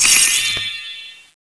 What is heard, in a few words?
draw; weapons; magicmg